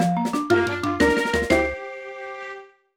Card Game Win; New Super Mario Bros

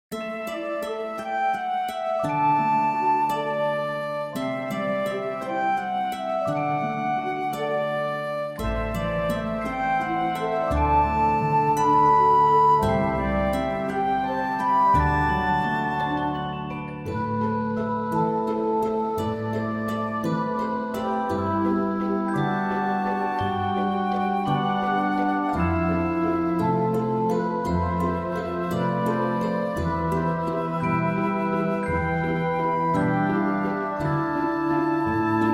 waltz; Fantasy Character Theme

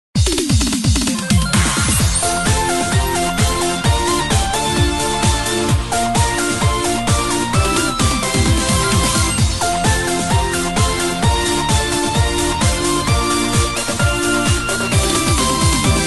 Music; Rhythm Heaven Megamix

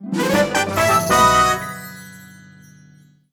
BGM Jingle Medal; mario wonder

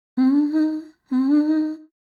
Humming

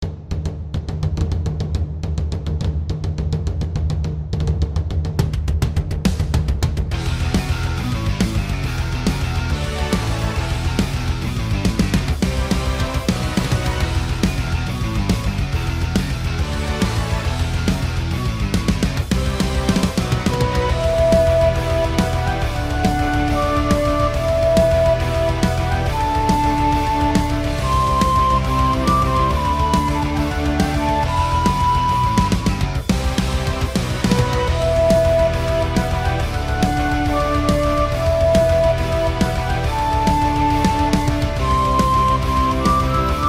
instrumental cover; Naruto

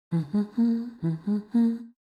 Humming; Link; The Legend of Zelda Tears of the Kingdom

